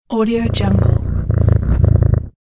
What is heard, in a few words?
cat purr